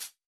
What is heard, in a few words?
Hihat; urban grooves; Rock